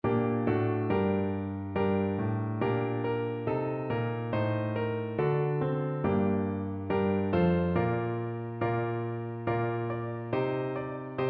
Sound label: slovakia national piano